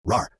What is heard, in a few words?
rawr fast